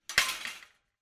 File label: industrial metal gate close